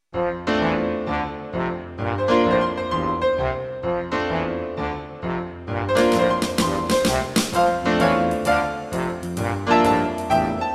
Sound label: Jibe Jazz